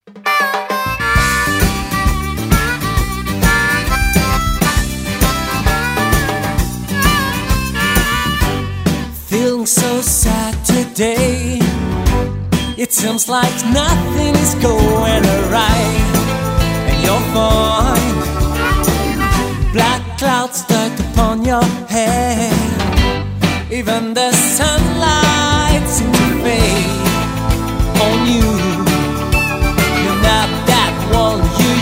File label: Lullaby